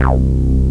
bass; Descent

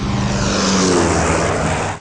plane

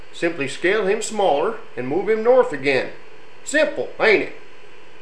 scale

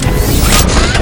Impact Alt Fire Start; UT

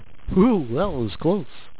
whew; Game Power Vol. 1 (HEPP Computer) (1995); INVASION

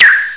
Shield; Loony Labyrinth 1.02 Demo

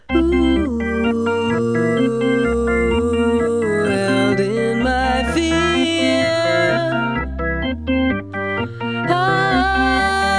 musc

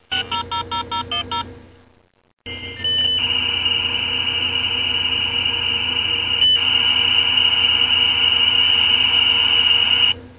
Modem; Bible Fun Pack; BIBLELND

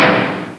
Kachunk; 3D Ultra Pinball Demo

